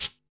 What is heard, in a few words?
trebly hat e key, Royality free high-hat tuned to the E note. Loudest frequency: 8493Hz